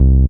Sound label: DM BAS, Dance Mega Synth